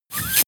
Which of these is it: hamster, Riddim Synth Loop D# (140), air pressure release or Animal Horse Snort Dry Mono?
air pressure release